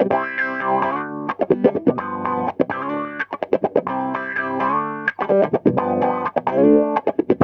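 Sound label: guitar, Roots Disco; USB Soundscan vol.32; AKAI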